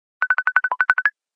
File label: Messenger ringtone down